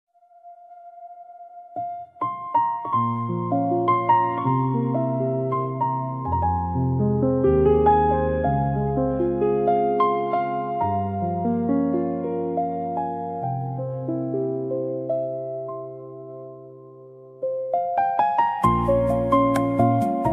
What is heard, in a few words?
instrumental music; zvuki nashego leta